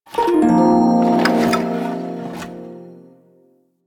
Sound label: Prime Shawzin Un Equip; Warframe